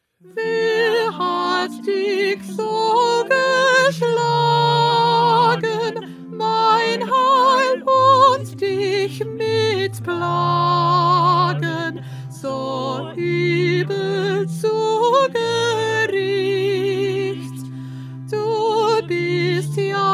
Soprano

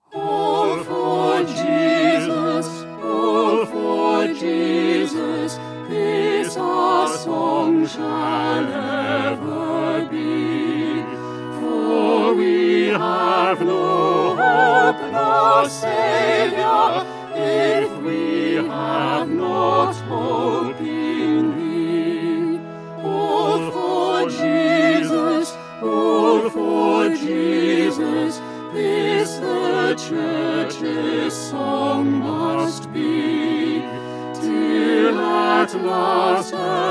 Concert Version

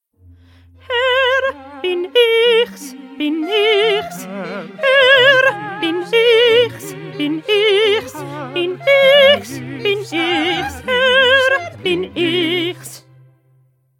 Alto; Bach; St Matthew Passion

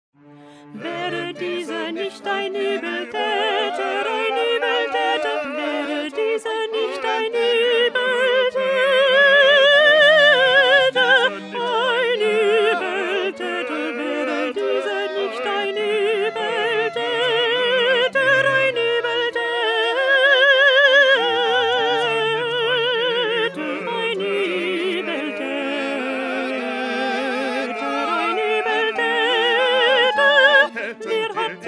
Alto; Bach; St John Passion

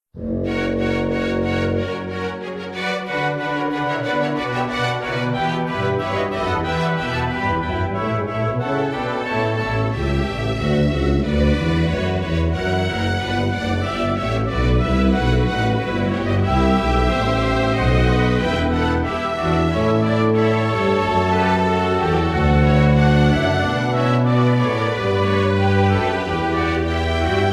Instrumental

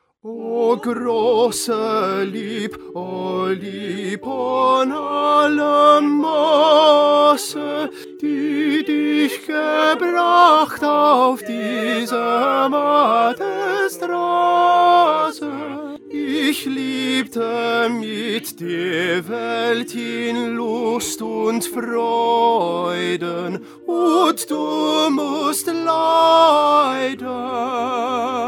Tenor; Bach; St John Passion

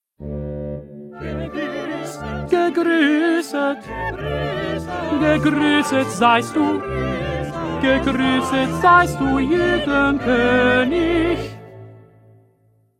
2nd Tenor

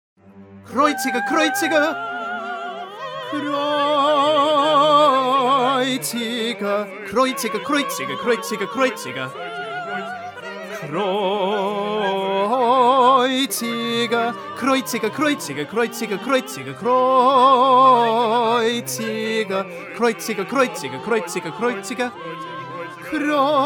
Tenor; Bach; St John Passion

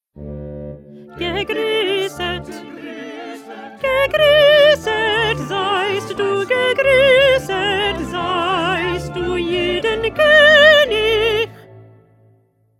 1st Alto

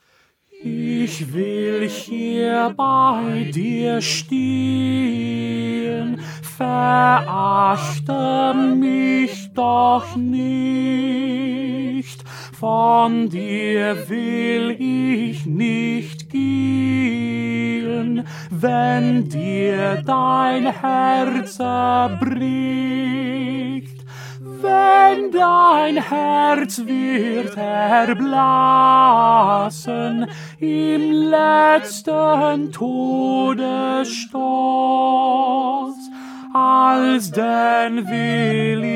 Tenor; Bach; St Matthew Passion

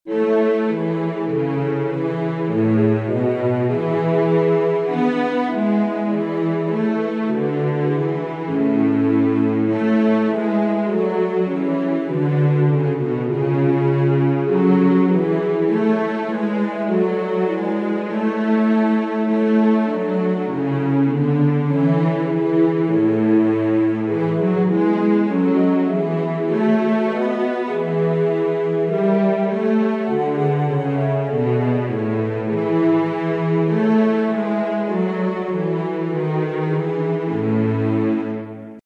Instrumental; Bach; St John Passion

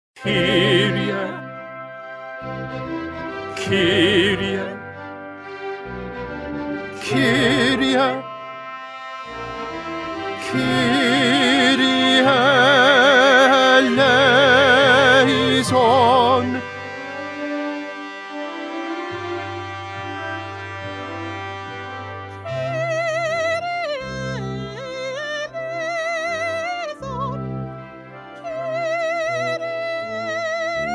Tenor; Mozart; Coronation Mass

